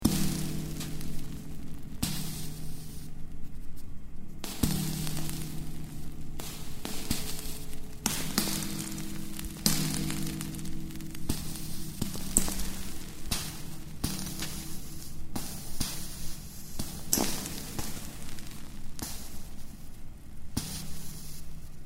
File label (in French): gouttes acier vapeur